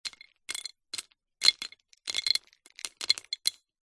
savory snack wasabi peas drop in ceramic bowl slowly; zapsplat